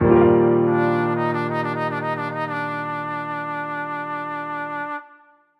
Retry Jingle; Friday Night Funkin Big Swingin Sister; Don't Stop

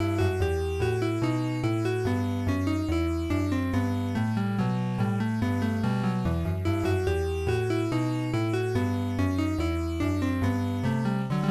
Lute Song; The Black Cauldron; Apple IIGS

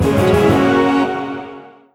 red team goal; skyhook

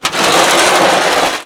door; Shin Megami Tensei IV Apocalypse; 3DS

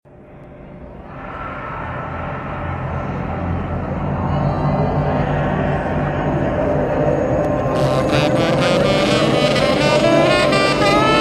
Creepy Sax; lisa the first

